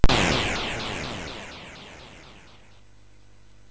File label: Bomb Chase Revival SFX, sid MOS6581